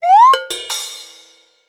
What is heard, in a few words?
notification; samsung Aleart